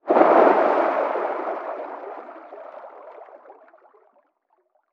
Underwater Water Splash Dive SCUBA

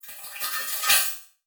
Transition Reverse Whoosh Reverse; Production Element

